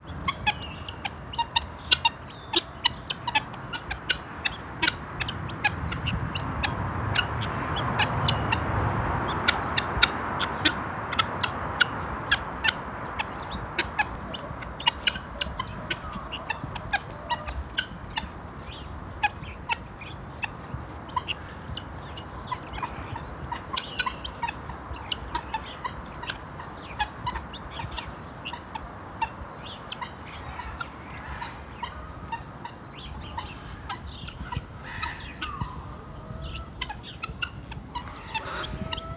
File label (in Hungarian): sisakos gyöngytyúk szélvédő; állathangok; nyíregyházi zoo 2010